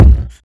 smooth bottom end kick sound b key, kick drum tuned to the B note